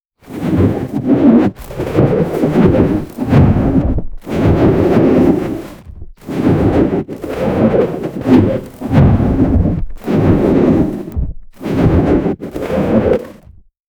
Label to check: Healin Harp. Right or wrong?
Wrong